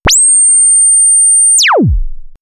Power Off, ambient synth fx; X-Static Goldmine

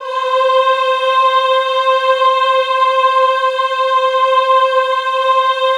EHH C4, Classical Choir; Best Service; Extended Classical Choir; EHH MIX

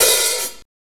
Acoustic Hat; Digital Sound Factory Xtreme Lead

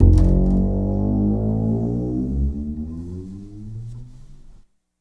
Standup Bass, Drum N Bass

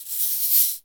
CABASA LNG, SHK+RATTL; Spectrasonics; Heart of Africa

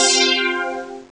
dx c suspended 13; Amiga Soundtracker Sample Packs

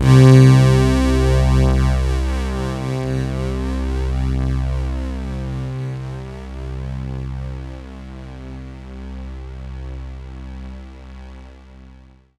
C2, Synth Line; 90 s Sample CDs; NiceBeats; SynthLine VOL-31

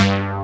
FATTY BS, DANCE; Pro-Rec - Synthsonic; Z1 DANCE

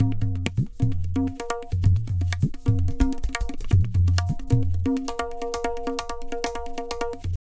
Tabla, 130 BPM; Zero-G; Pure Tabla

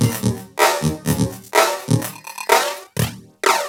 LOOP, TECHNO; TECHNO-BOX; ELEC-LOOPS

